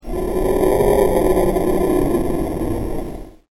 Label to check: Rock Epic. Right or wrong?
Wrong